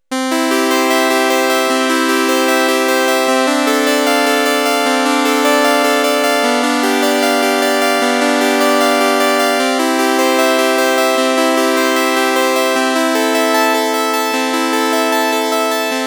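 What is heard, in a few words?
bach clavecin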